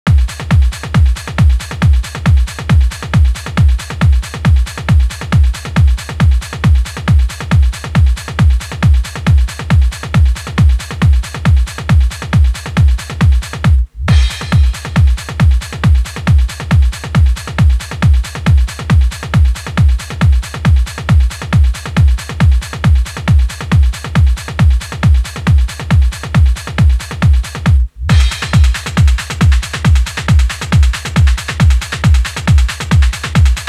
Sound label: Original Vocal, TRANCE CLASSICS